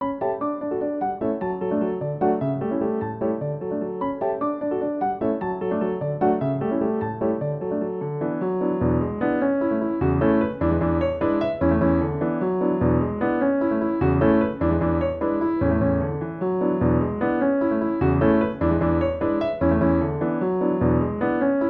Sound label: waltz; 5 steps